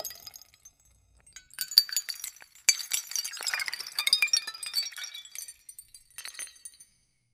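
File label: spreading of glass pieces; break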